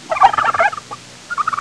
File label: turkey, farm animals; Sound Effects for Multimedia - Volume 1